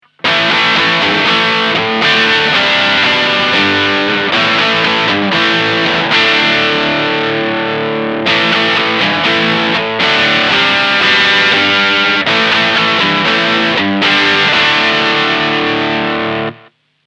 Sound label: dist XLR DI out; Two Notes Torpedo Captor